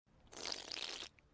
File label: slurp; mtn dew